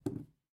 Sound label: Carrot Drop; Game rip; MiSide